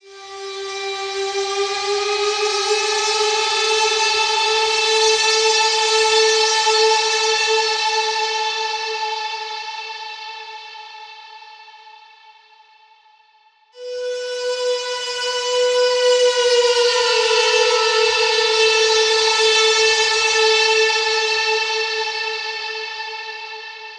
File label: Bend Note Am 70Bpm; Construction Kits; STEMS; Ghosthack; SkyRealm; Kit 20 - Sea Of Souls